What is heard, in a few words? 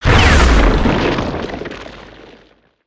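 Earth Tornado End Blast; Spinjitsu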